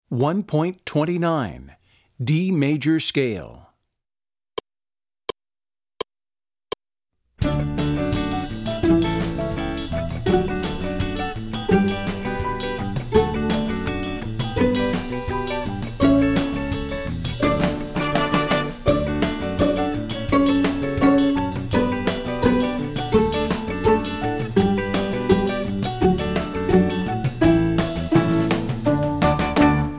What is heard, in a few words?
D Major Scale